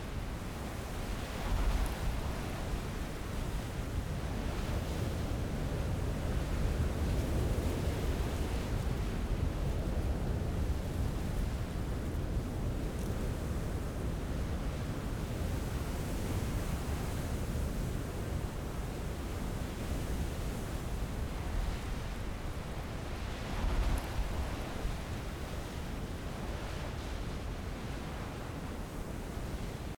outdoor schoolyard gale

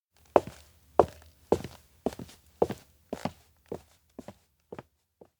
steps indoor room go deep; awayuki; anemoi パッケージ版 1.1

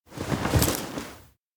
lift luggage; anemoi パッケージ版

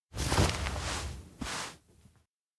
back pat; baron

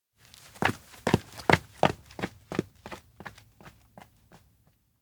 steps indoor school run; rikka; anemoi パッケージ版 1.1

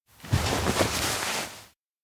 kigurumi off; anemoi

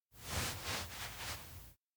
pet rough; jet; anemoi パッケージ版 1.1

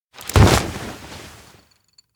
floor fall 3; awayuki; anemoi

